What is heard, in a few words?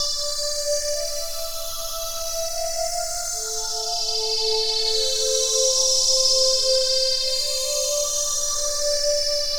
Cream Pad, Keys; Rock